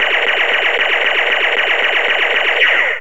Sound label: firing deluxe 10 shots